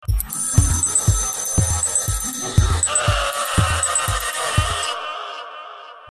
Scary Ringer

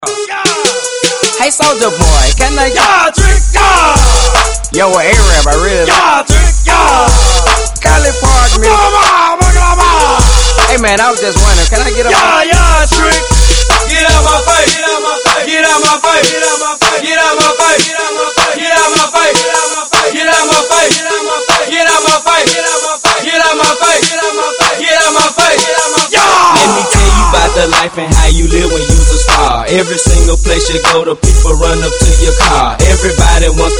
yahhh

